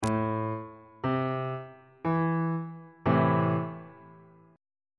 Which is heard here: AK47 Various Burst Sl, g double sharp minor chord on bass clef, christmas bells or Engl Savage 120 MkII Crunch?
g double sharp minor chord on bass clef